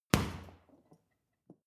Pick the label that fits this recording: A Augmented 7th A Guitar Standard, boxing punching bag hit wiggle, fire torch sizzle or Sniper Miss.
boxing punching bag hit wiggle